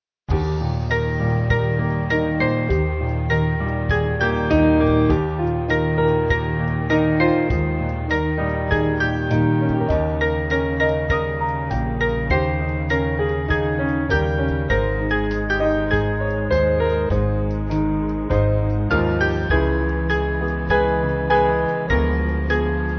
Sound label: Band